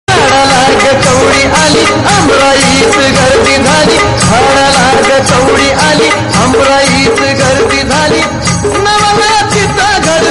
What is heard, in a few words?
Tamil South Indian